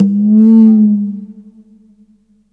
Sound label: Bent Bongo; Zero-G Jungle Warfare 1, 2 & 3; 90 s Sample CDs